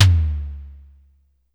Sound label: Drum X Tom, Pearl